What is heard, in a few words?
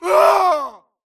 grunt death crush; Titanfall 2